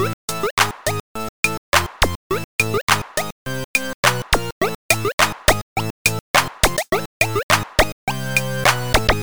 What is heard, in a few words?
beat; GlobalGameJam; Rise Of Blorbs